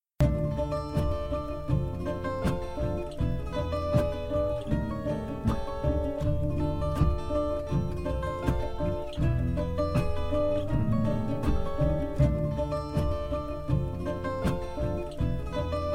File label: loop